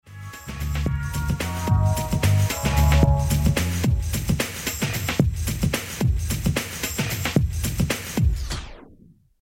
Beat

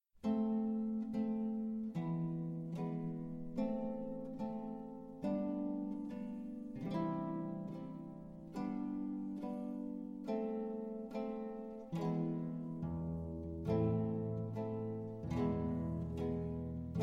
Folias (Imperfectas) [Harp]

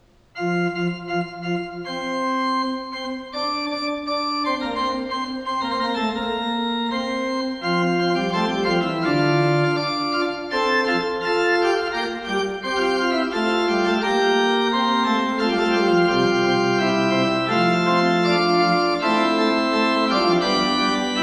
Fugue, Organ; Magnificat sexti toni; Johann Pachelbel; Complete Organ Works

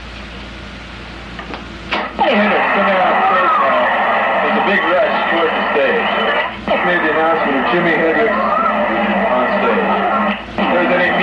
Tuning Up

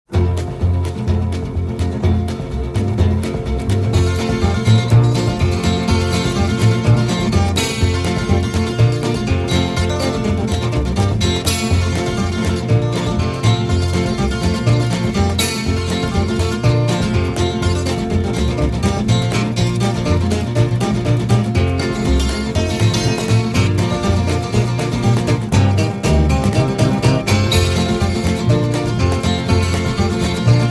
12-String Special, 12-String Guitar

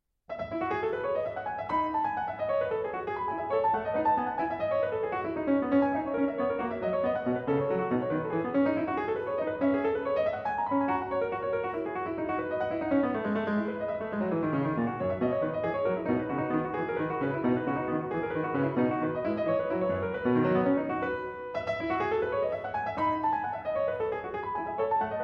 French Suite in E, Courante; French Suite No.6 in E, BWV 817; Vladimir Ashkenazy; Bach French Suites, BWV 812-817

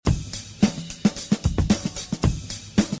Beat

